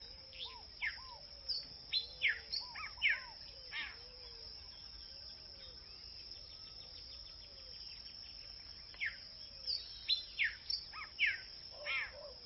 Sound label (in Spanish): tolditos, aves